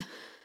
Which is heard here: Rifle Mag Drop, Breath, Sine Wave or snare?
Breath